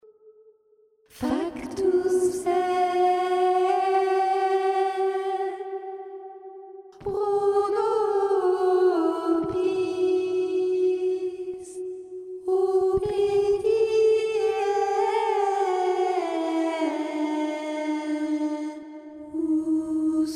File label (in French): passionne christus alto